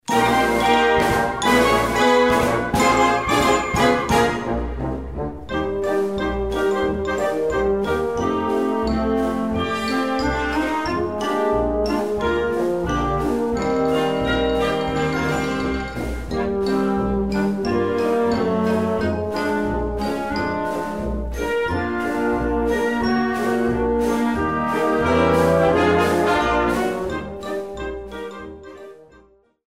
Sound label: CHINESE RAG